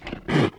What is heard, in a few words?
attack